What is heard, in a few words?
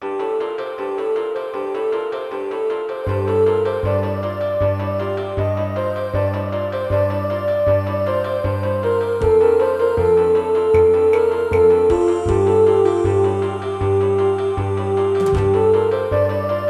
intro gus pnp, Gravis Ultrasound PNP; soundcard test; Silpheed; Gravis Ultrasound PNP (external player and utopia)